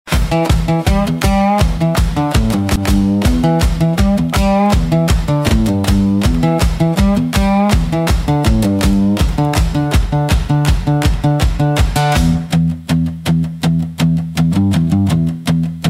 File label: blues